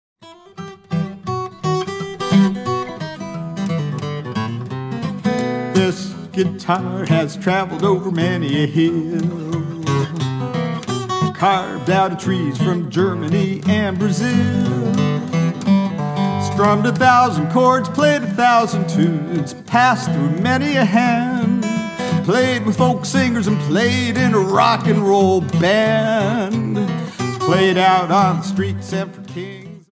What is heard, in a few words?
Guitar